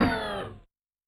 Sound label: MOSHER; Yamaha DTXpress-II